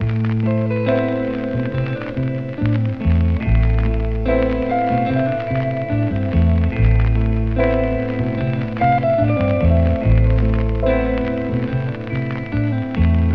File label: guitar, Loops, Sad